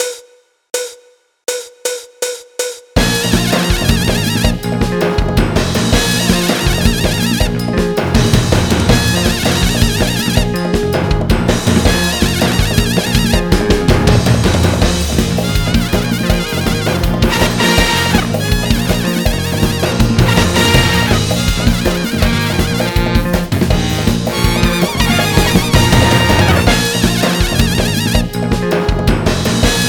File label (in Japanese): bansou